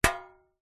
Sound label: foley tin metal cylinder lid on flick ping impact; zapsplat